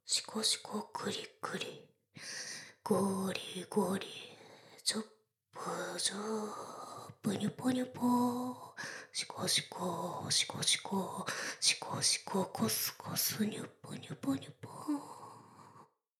しこしこ; ダウナー風俗

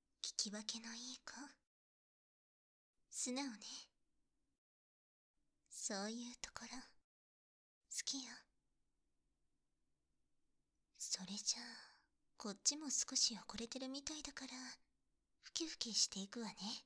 左耳かき, ASMR

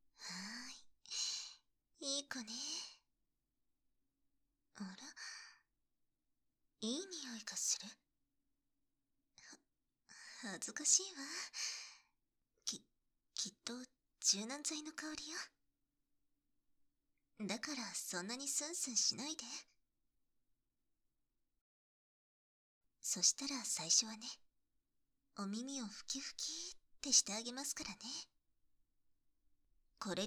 右耳かき

